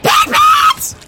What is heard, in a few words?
pig